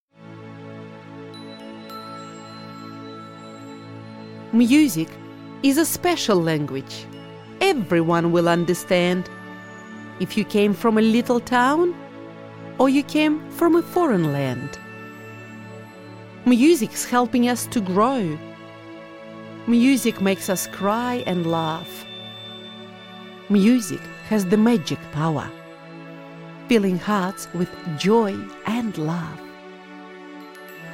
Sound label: Music